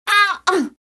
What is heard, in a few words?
HIT; BattleSNDS